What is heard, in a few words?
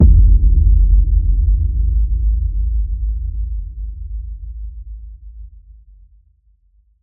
HIT Boom, Cinematic Hits Designed